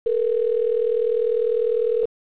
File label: US Progress tone